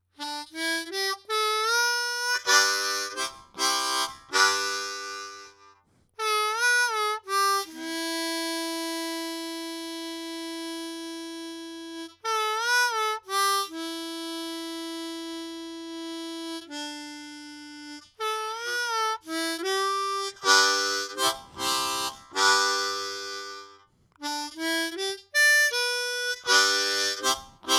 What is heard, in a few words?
Shure SM57 blues